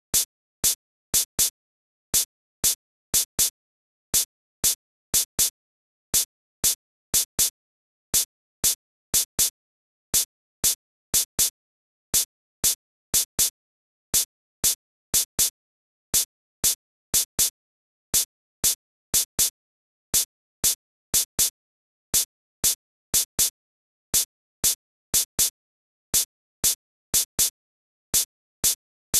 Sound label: shaker; hydrogen